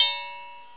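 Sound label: triangle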